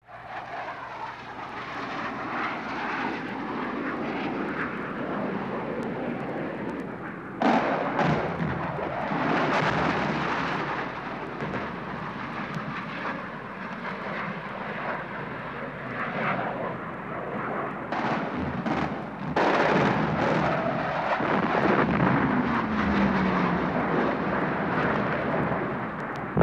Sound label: Jet Bomber